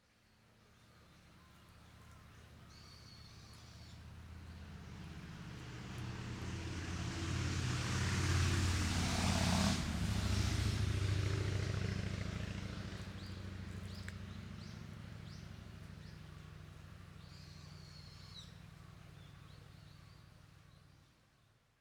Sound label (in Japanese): 森でバイクが通り過ぎる音